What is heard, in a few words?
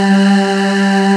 AHH G2, Fairlight Aahs; E-MU Producer Series Vol. 2 – More Studio Essentials